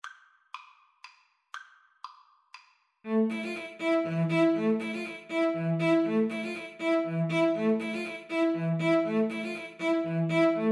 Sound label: Bolero, Flute Cello Duet